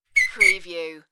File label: Ref Whistle Shrt